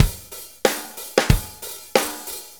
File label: Shuffle Loop, Full Kit Loops